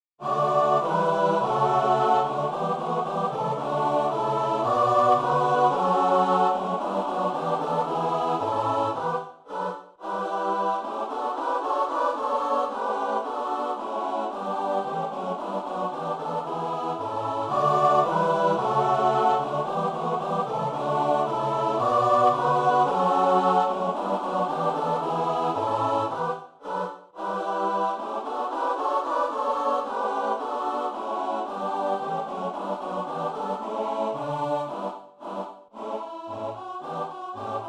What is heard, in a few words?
midi; twardowski; serce roscie